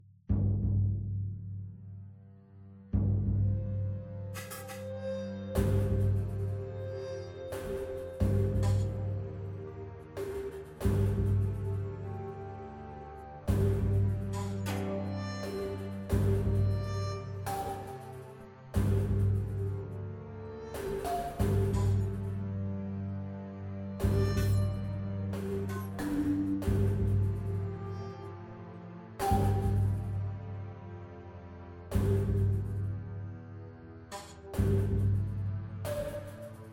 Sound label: tension loop